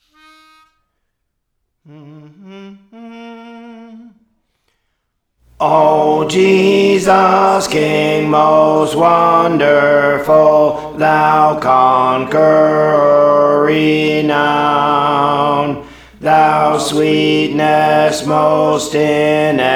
Alto, Songs; Praise For The Lord Training

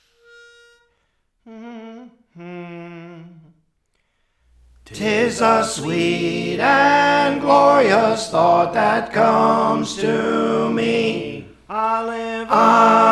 Tenor; Praise For The Lord Training

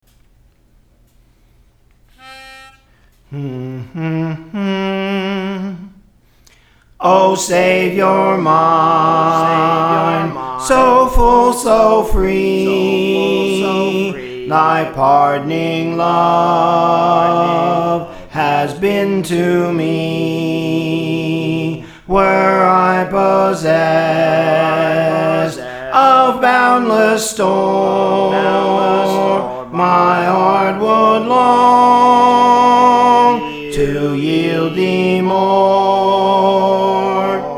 Soprano, Songs; O Savior Mine; Praise For The Lord Training

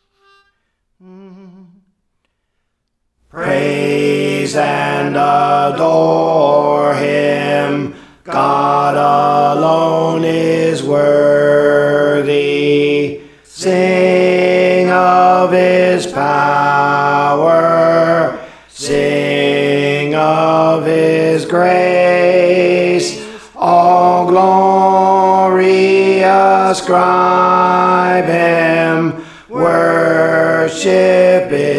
Alto, Praise For The Lord Training

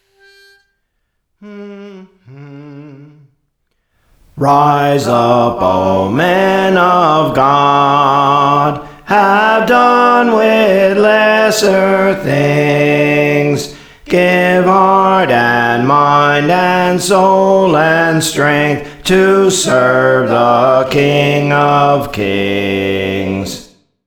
Bass; Praise For The Lord Training

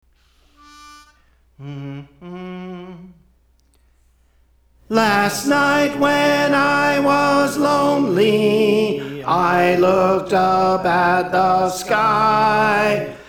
Tenor; Praise For The Lord Training

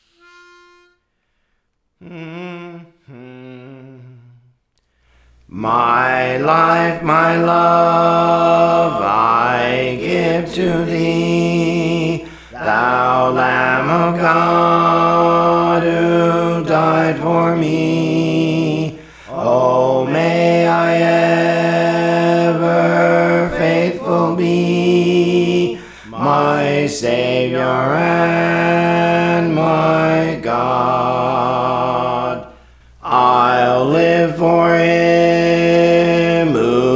Alto; Praise For The Lord Training

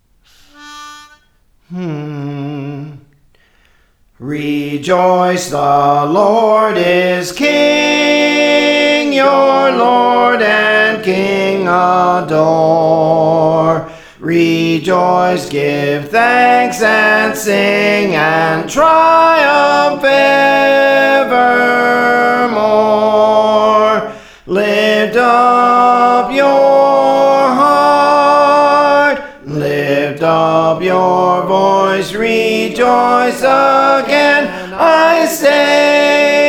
Soprano; Praise For The Lord Training

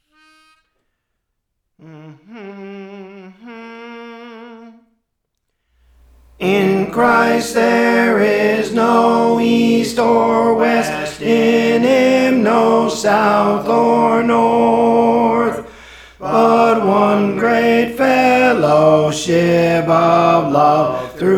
Tenor; Praise For The Lord Training

